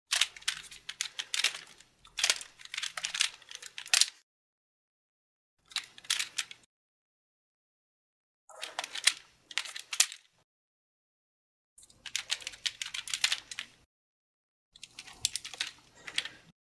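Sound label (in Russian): вращают револьвер на пальце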